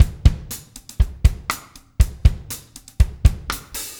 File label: DUGA, Backbeat